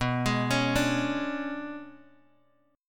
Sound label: B-Minor 6th Add 9th B down Guitar Open F; Bm6add9 arpeggiated; JGuitar